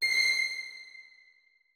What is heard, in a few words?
notes, keyboard; Hideout Furniture